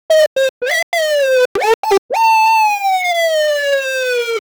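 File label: steam hiss loop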